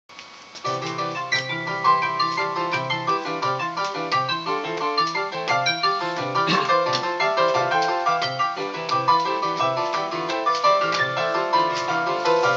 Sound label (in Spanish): Medio tempo improvisacion con piano y canto; Micros kinect